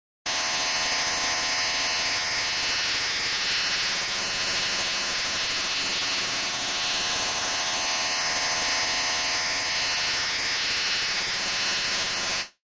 Brain Neuralizer Stream. Synth Type Modulating Tone.